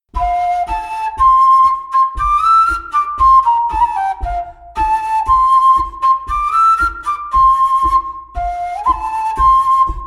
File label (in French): Bourree parisienne bourree